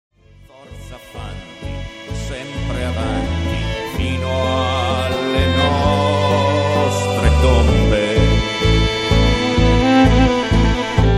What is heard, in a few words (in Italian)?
Trombe; musica